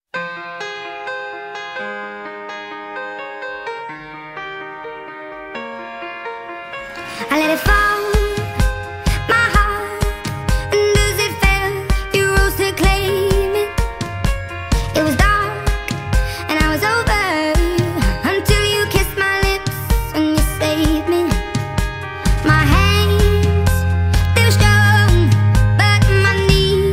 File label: sped up